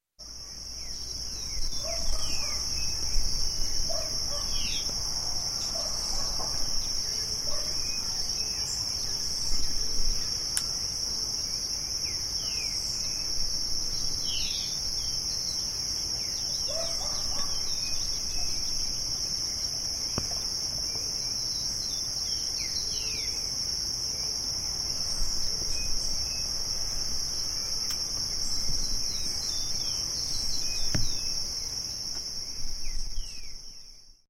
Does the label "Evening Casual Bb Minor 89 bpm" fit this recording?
No